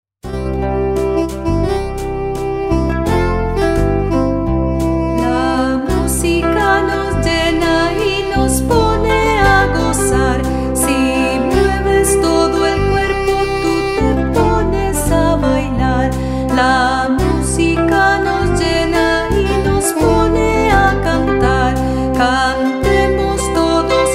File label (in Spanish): La música